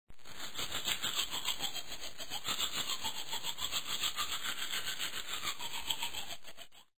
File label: brush teeth